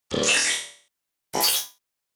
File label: Droid Wet, robot; Robot Sound Effects